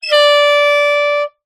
sport air horn 1x blast; zapsplat

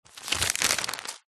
household magazine cheap page turn

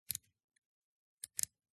Camera Film 35mm SLR Shutter Speed Dial; CANON AE-1 Program; MKH8040; PMSFX

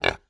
animal pig kunekune grunt

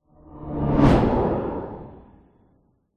science fiction land vehicle pass by zoom fast; zapsplat

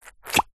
cartoon squeeze pop squelchy

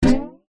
cartoon impact strings slight ascend

